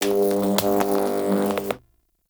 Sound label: Teralyst Electric Step; Enemies; Steps; Warframe; Eidolon